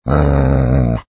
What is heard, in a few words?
ANIMAL DOG